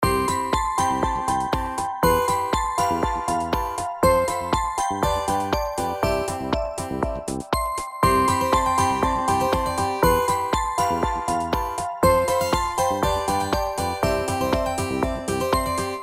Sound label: Festive, ringtones; Okapia; Spark